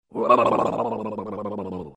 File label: double Take; disney; mashup